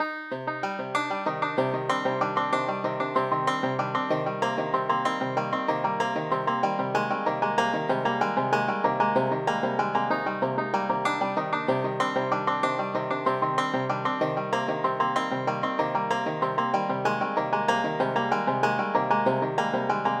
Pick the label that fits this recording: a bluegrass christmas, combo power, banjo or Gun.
banjo